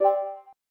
MMS, LG Optimus G

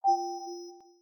fingerprint rollback; LG V10

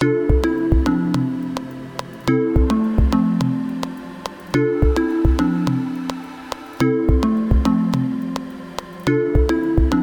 Poolside, Ringing Tones; HMD Barbie Phone US

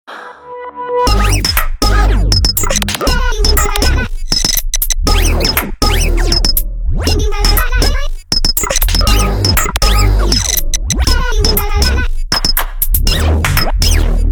Ahh Glitch, Nokia Ringtones; 208

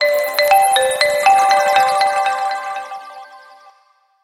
Bio Alert; Motorola Backflip

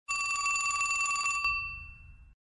Vintage Telephone; LG; X350

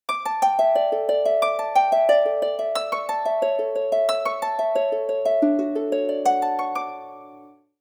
Capriccioso, ringtones; Umidigi; F1-Play

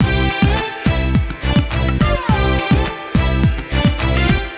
World, ringtones; Romancing The Tone; Google; NewWave Labs

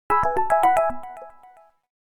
A toy watch; Samsung Galaxy S2

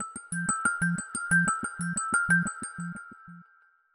Birdsong Flow; Samsung Galaxy S26 Ultra; ringtones

